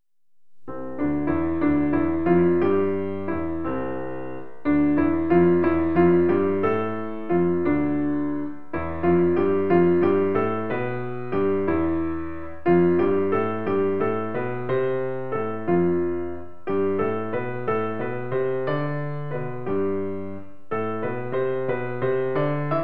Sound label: Keyboard Pattern